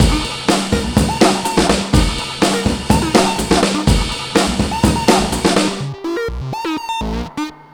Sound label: DRM 124, Breakbeat